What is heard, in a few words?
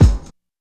subtle reverb kick drum single hit g sharp key